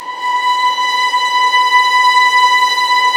STR VLS, Roland String Master Series, Vlns 6 p-mf

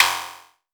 Tempest Analog Snare, Drum Machines

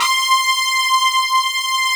SD1 FAT, Synthesizers, synbrass

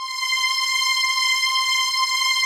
SLOW STRINGS, JV PADS; Pro-Rec - Synthsonic

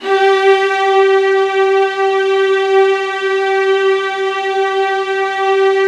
VIOLINS, Synclavier Strings; ILIO

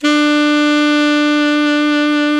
sax alto mp; Alto 414

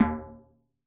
BODHR; Masterbits - Total Zone; ADD.PERC.

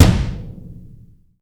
POWR BD, POWER DRUM; AKAI S6000 CD-ROM - Volume 1; DRUM RYTHM

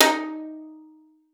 D#4, VIOLIN PIZZ; Xsample VOL-5; 90 s Sample CDs

